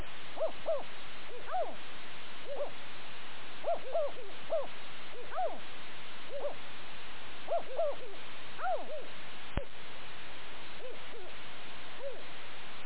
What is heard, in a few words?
owl pair